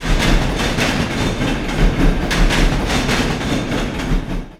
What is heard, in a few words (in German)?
Schienenstoesse